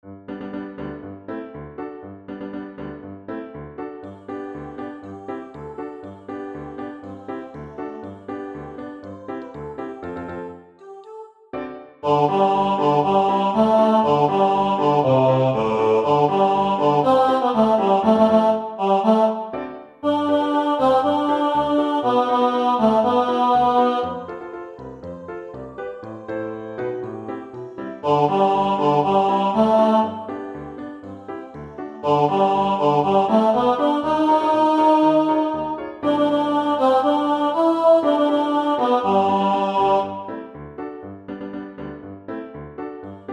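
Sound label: Bass; Comedy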